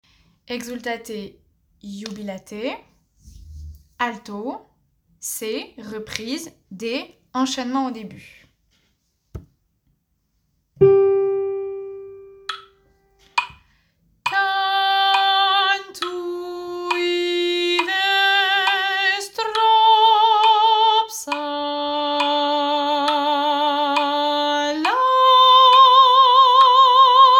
Exultate alto

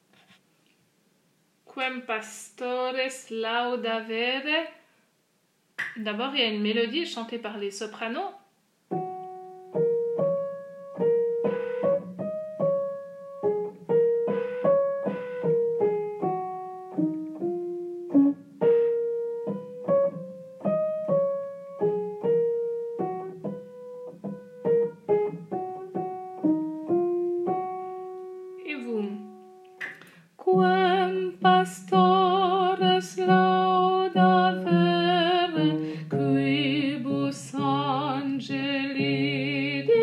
Quem Pastores Laudavere Basse

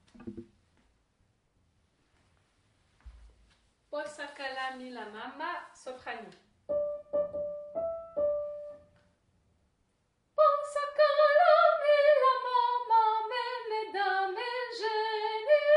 posakala mila mama sop

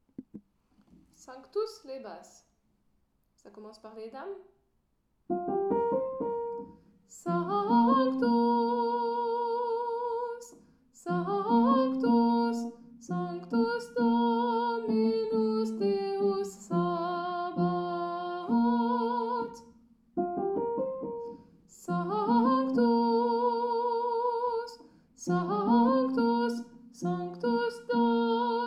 sanctus basse

